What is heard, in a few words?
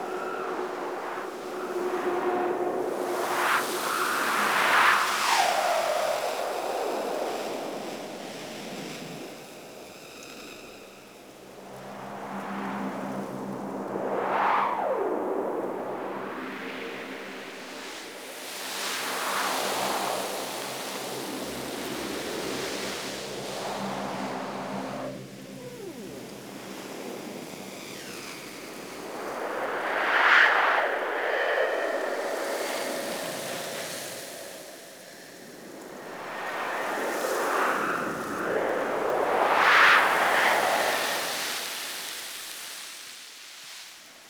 Landscape Wind Low Loop